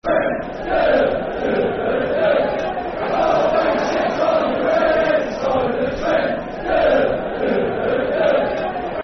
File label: Always Shit On The Red Side Of The Trent [Football Chant (Soccer Songs)]; Leicester City Lcfc